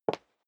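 step high heels wood parquet single right, footsteps; schritte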